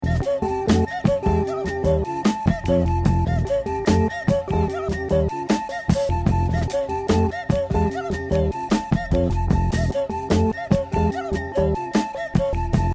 groove

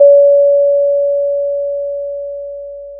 pop, Sound of a school bell

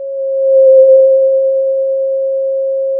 pop, Sound of a cruise ship horn

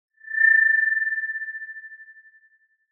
Sound of an owl

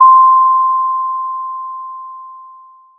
Sound of a doorbell

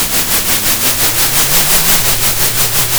pop, Sound of a train

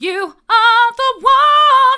hard, vocal

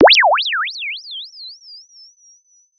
Sound of a bird tweeting nicely

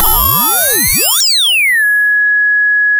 pop, sound of a bike bell

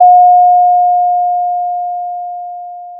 pop, Sound of a doorbell

